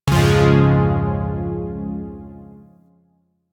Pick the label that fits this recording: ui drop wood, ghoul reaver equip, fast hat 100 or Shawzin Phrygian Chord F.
Shawzin Phrygian Chord F